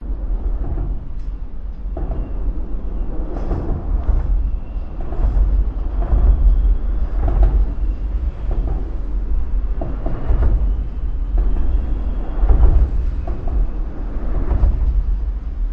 under bridge cars trucks motorcycles busses pickups vehicles traffic; vancouver; lions gate bridge; your free sounds